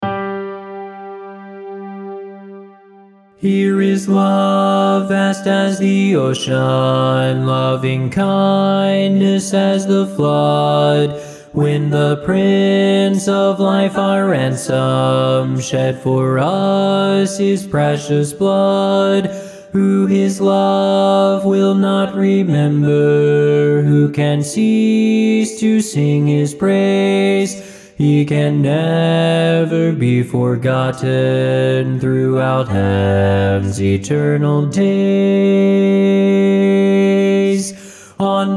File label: BASS